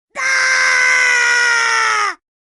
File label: bwaaah; raving rabbids